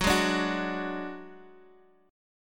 Gb Suspended 2nd Sharp 5th Guitar Half Step Down; Gbsus2#5

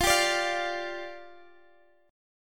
F 6th Suspended 2nd F, chord; F6sus2 strummed

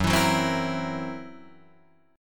Gb 7th Flat 5th Gb Guitar Drop D

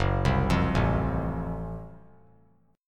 Gb Minor Major 7th Flat 5th, chord; arpeggiated; 6,7,8,7; JGuitar

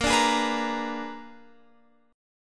Bb 7th Flat 9th Bb, Guitar Open F; Bb7b9 strummed

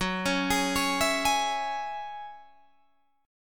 Gb 7th Suspended 2nd Gb Guitar Open D

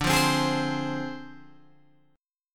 D Minor 7th Flat 5th Guitar Open G

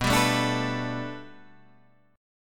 B-Minor 7th Sharp 5th B down; Bm7#5 strummed

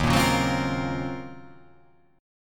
Eb Minor 13th, Ebm13 chord; strummed; JGuitar

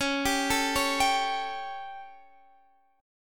G Suspended 2nd Flat 5th Db, chord

